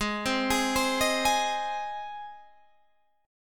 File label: G sharp Major G sharp, chord